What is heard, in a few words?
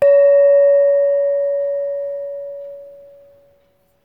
gong